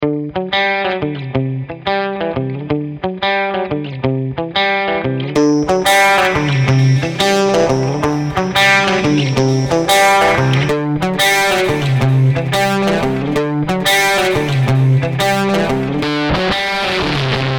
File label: guitar models; nuxx